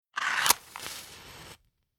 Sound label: Fire Match Strike Slow Single Match Flame; Flame Sound Effects